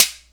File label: snare lite